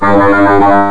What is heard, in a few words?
End Game; Tetricycle